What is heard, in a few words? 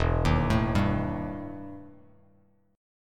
E Minor Major 7th Double Flat 5th E Bass Standard; arpeggiated; JGuitar